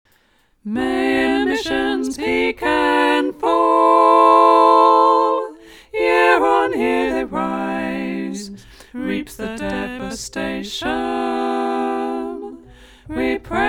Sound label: SSA